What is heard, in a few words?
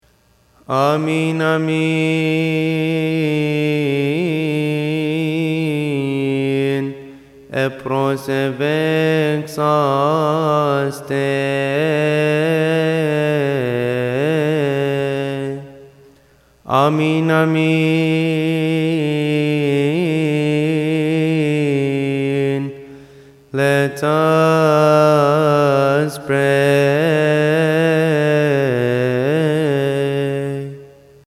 Amen Amen Pray